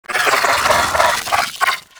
Vocal Idle; Warframe; Moa Satyr

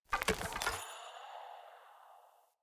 Staff Caster Equip; Weapons; Player; Staves; Wazzard Staff Caster

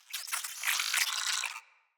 Space Drone Attack; Enemies; Corpus

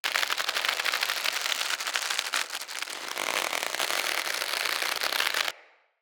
Rope Winch Rope Pull Tension Loop

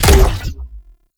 Grenade Shot; Grineer; Weapons

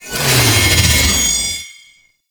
Dash Charge Up; Warframe; Lasrian Soldiers; Abilities; Butcher

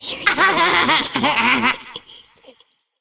Girl Idle H; Warframe; Zariman; Skitter Girl

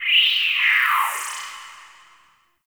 Class Lesson Slide

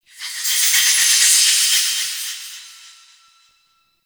Small Metal Echo, Ambience; Warframe; Entrati

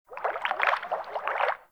Fish Tank Movement; Warframe; Hub; Liset Personal Quarters

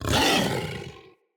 Kubrow Feral Vocal Angry Attack Charge

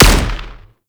Anti Aircraft Gun Shot; Weapons; Warframe; Archwing

